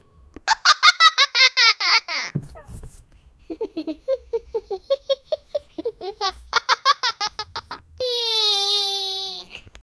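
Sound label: playful rustle of movement, child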